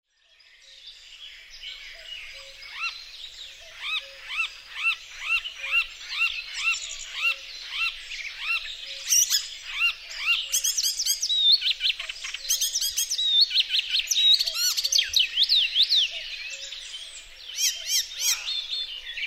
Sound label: icterine warbler